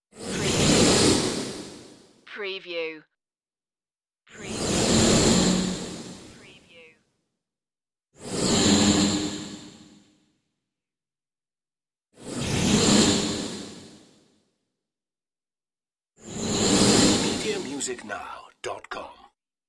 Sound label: hollow transform swooshes